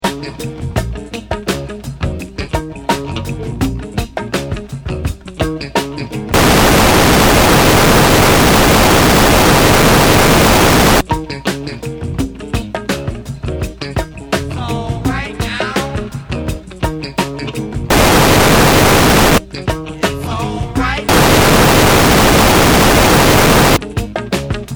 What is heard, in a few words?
Long Noises